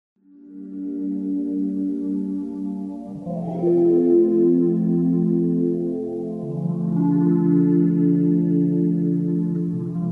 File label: autoharp peace